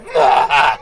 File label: sick man